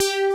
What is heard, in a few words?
FUNKY LD BS, DANCE; Pro-Rec - Synthsonic; Z1 DANCE